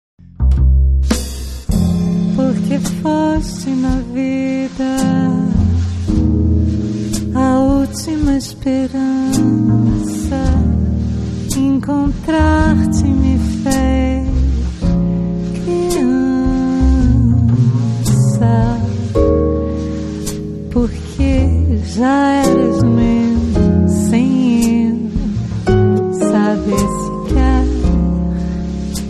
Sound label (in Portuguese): harpa bossa